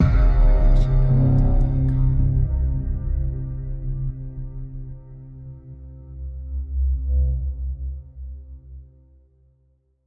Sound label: Suspenseful Ident; soundscrate